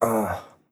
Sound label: Grunt, MVoice